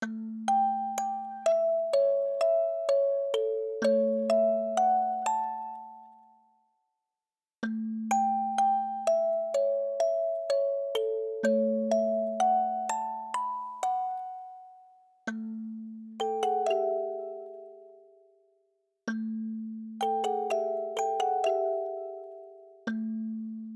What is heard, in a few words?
Pondering (Music Box)